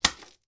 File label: game plastic houses and hotels in cloth pouch drop on table; monopoly